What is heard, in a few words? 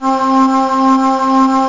Choir Aah; GMPlay; Ensemble